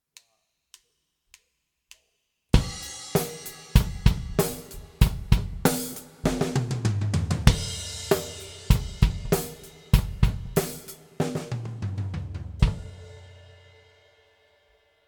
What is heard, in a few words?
Delay Mix, drum recording; Drum Recording Setup